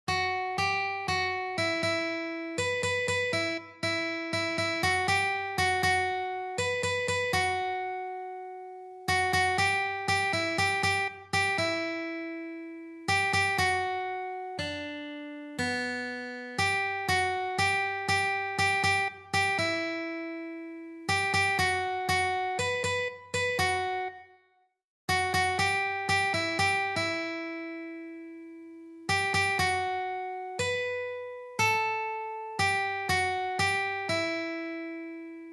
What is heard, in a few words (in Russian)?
na gitare